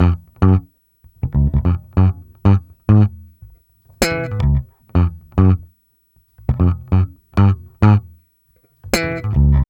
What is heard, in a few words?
BASS, Funky Ass Loops; East West